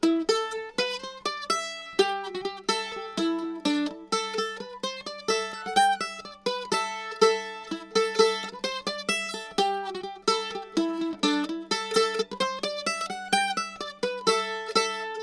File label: mandolin